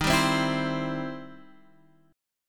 E Minor Major 7th Sharp 5th Eb

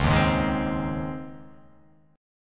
C sharp Major 7th Suspended 2nd

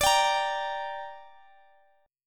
D Suspended 4th Sharp 5th D, down, Guitar DADGAD

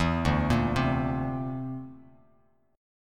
D 7th Suspended 2nd Sharp 5th D, Bass Standard; D7sus2#5 arpeggiated; JGuitar

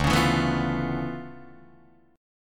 D Minor Major 13th, down, Guitar, DADGAD

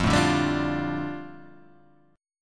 G 7th Suspended 2nd

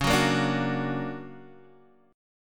D Augmented Major 7th, chord

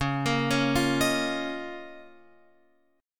Db 6th Add 9th Db down Guitar Open F; Db6add9; arpeggiated

